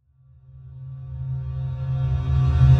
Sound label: Riser C; Shadow; Ghosthack